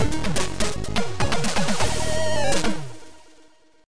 Game Over; Delta s Shadow

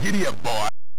Title AY Beeper; Chase HQ

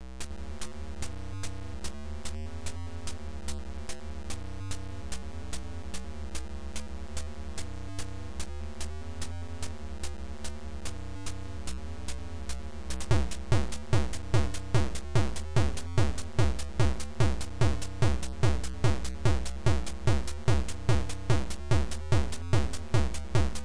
TECHNO

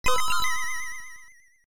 Title AY; Hawk Storm

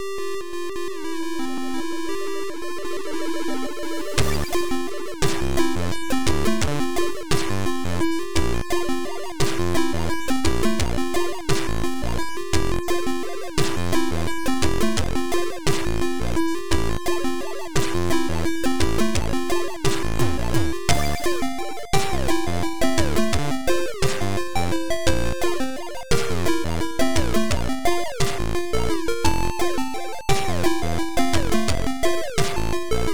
Quiet Rain and Wind

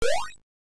Title Beeper; Hydrofool

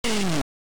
Title AY Beeper; The Arc of Yesod

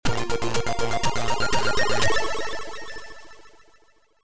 Boss Win; Rustles Land 2; the land of rustles 2; arcade; classic platformers

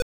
Start, Beeper; Hunchback

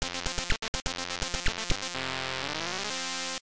Title Beeper; ATV Simulator

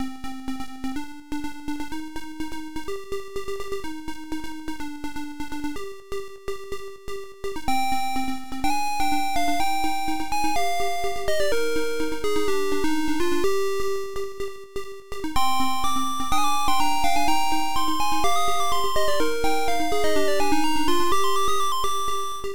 Game music; Manic Miner

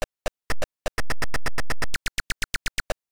Title Beeper; Ping Pong

